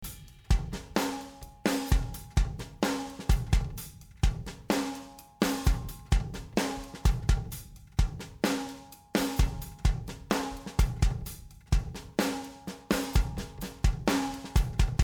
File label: drumloop original